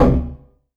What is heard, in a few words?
DRM PRC Perc Tech; Polyend; Plughugger